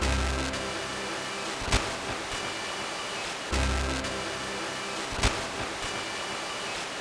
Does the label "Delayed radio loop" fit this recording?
Yes